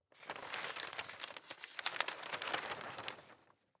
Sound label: Newspaper Open; Foley; Blastwave